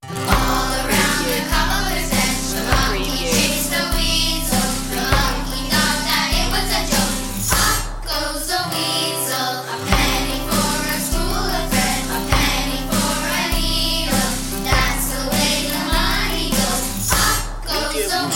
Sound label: pop goes the weasel children group vocal no sound-fx